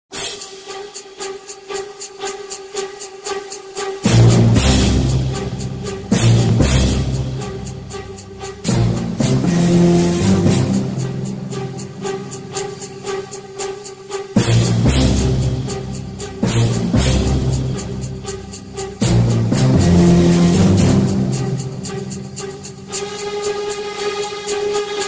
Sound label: theme music; indian 2